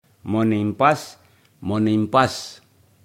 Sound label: sweep